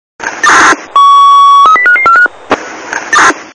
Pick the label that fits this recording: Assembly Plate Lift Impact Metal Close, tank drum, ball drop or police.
police